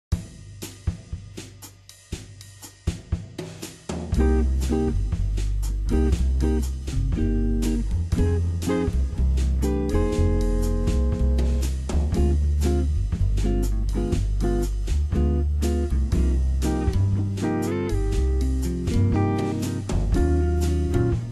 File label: backing